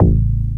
attack, BASS, Techno Trance; Techno Trance Essentials

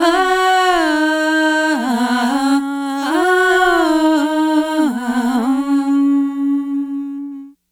Kirtan Ah Choir, East Indian; Sonic Foundry (Sony Creative Software); Continental Drift Aftershocks; Vocals

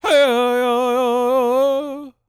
World Voices, CHOCTAW; Spectrasonics Vocal Planet CD5

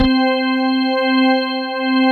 ALL STOP, CHURCHY

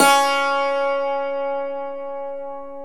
Dulcimer C#3; Stereo Dulcimer

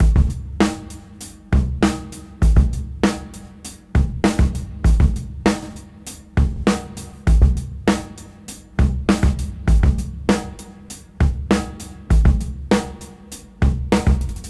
Break 99 BPM; AMG; Keith LeBlanc Phat Butt Jams

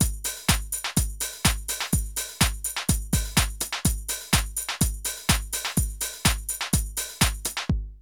drum loops, House & Garage

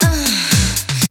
SEX; Zero G; Man Machine

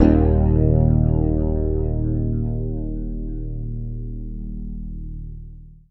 D#, Bass; Sampleheads; Will Lee Bass Library

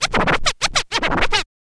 scratch kit, vinyl; Masterbits; Vinyl Attack

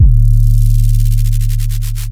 D 808 Flutter

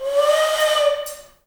AF FLUTES, Heart of Africa

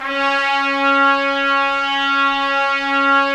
Trumpets; Roland - LA Composer vol. 4

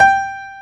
PIANO G4; AKAI S-Series CD-ROM Sound Library VOL-2

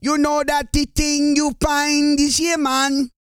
REGGAE, Vocal Planet World Voices

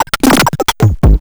grind, EMU XL-7

